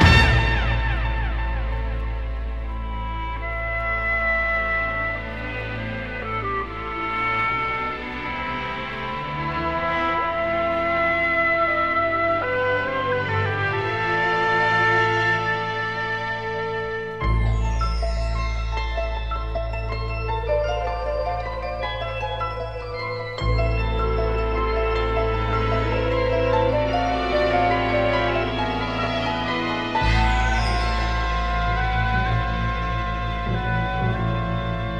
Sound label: strings, Sad